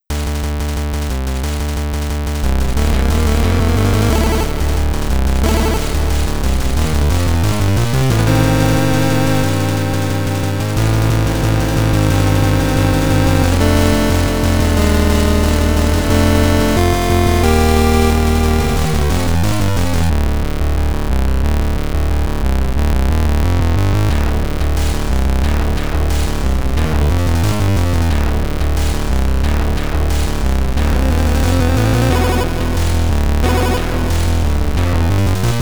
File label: Chiptune; Hexagons